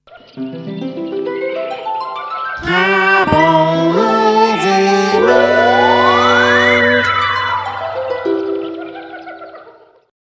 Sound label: jingle; hazyland